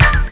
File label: Springy; Arashi 1.1; FSSD SoundEdit Mac Sound